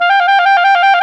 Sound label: WND TRILL, Clarinet FX; Roland LCDP04 Orchestral Winds; 90 s Sample CDs; Clarinets